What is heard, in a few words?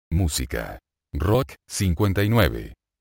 rock